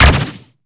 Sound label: shield hit; skbt astro fleet